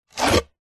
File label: sport skateboard scrape deck pick up; zapsplat